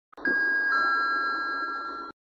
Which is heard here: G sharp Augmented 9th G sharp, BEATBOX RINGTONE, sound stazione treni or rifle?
sound stazione treni